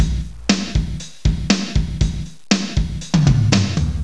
BOOMBAP

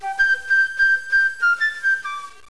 FLUTE

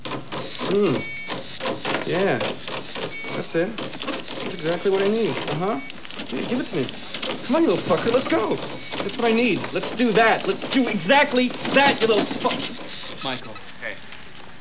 Fax

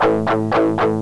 GUITAR

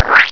SQUISH

